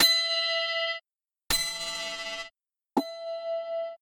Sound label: Bells